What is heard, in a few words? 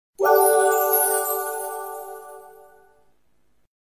Wii Golf Sparkles